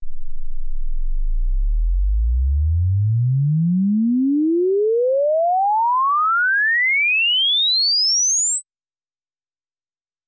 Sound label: exp swept sinus 10Hz 24kHz